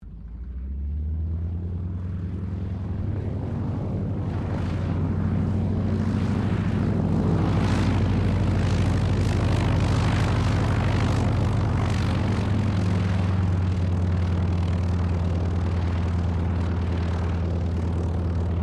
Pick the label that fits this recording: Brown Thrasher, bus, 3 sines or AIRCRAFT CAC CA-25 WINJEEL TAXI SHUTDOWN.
AIRCRAFT CAC CA-25 WINJEEL TAXI SHUTDOWN